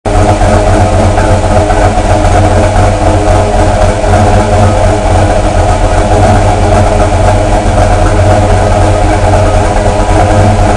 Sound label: hear it idle